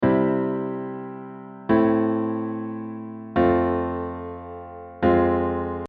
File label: Chromatic Alterations